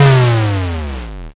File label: explosion l